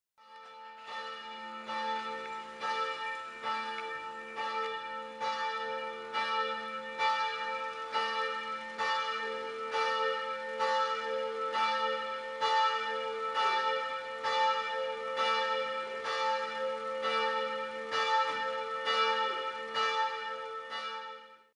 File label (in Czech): zvon; nova paka; p. maria